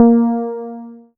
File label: A#, PIANO; Big Fish Audio; Synclav FM